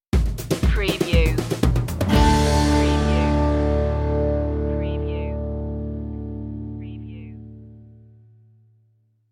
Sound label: transparent stinger